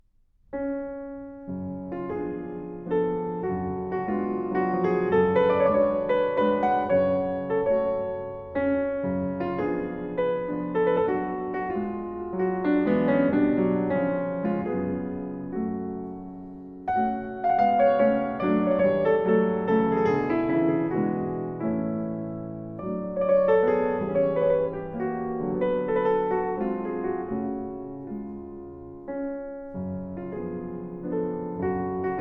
Popular Melody

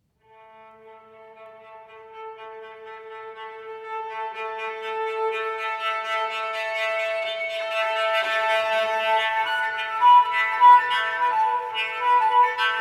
Leggiero, molto espressivo, Solo Cello

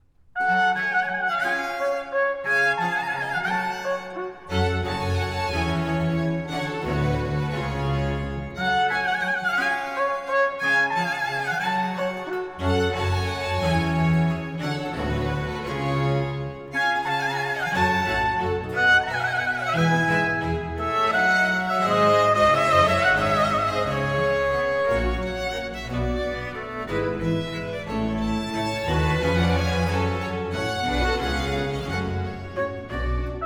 Concerto Grosso in G Minor, Vivace; Op. 6 No. 8 'Christmas Concerto' IV.

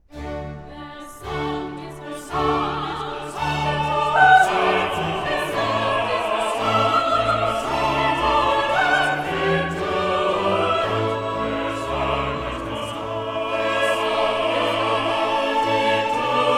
Chorus; Part 2 Their sound is gone out into all lands; Handel Messiah, HWV 56

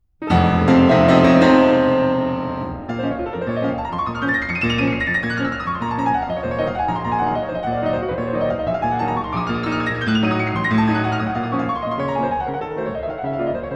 Préludes, in B-Flat Minor; Chopin Preludes

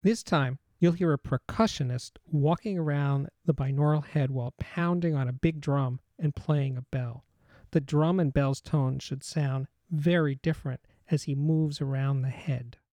Drum and Bell Walk Around the Mic Announcement; The Ultimate Headphone Demonstration Disc ( 24Bit192kHz ); Chesky Record

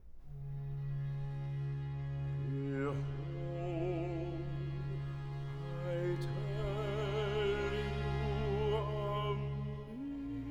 Bass; Part 3 Behold, I tell you a mystery; Handel Messiah, HWV 56

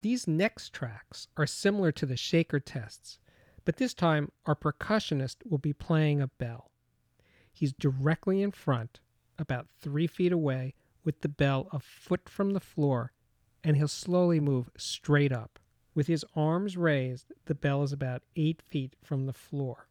Center Bell Announcement; The Ultimate Headphone Demonstration Disc ( 24Bit192kHz ); Chesky Record

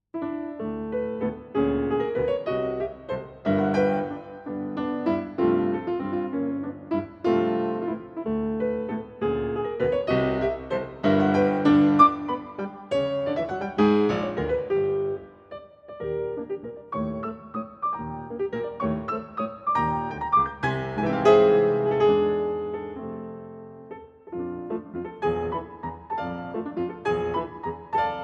Passepied (Arr. For Piano); Ten Pieces from Cinderella Op. 97

